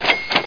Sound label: cash; MPG-Net (USA); empire